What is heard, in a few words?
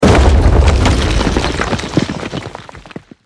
tnt